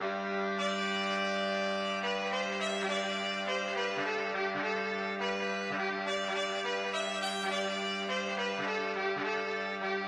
Town; MapleShrine

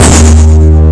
laser; MapleShrine; mkxp; gameasync

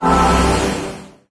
Heal; MapleShrine; mkxp; gameasync

